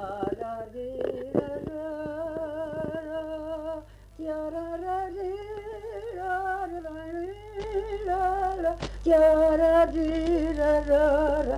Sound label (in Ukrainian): vals pid yazyk